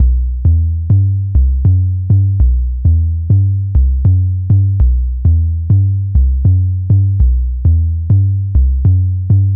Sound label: Bass 808s Unique Before